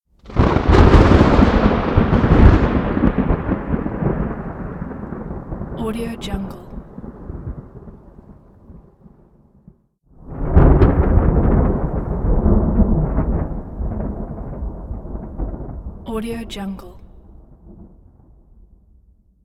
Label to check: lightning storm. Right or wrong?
Right